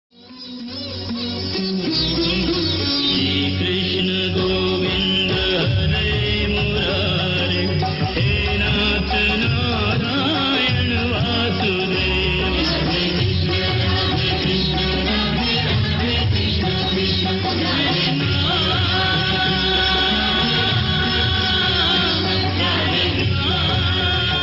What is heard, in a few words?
govind hare murari; RAMANANDA SAGAR